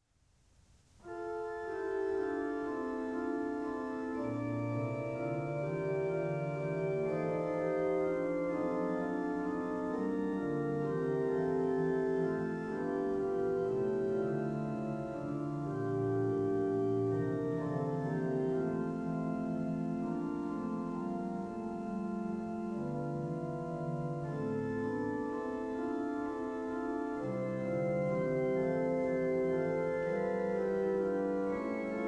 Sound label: Chorale Preludes for organ; Op. 122 No. 6; O wie selig seid ihr doch ihr Frommen